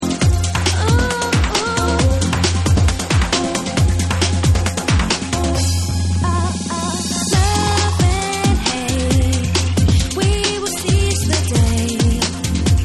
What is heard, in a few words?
Dj Extended Break Beat Mix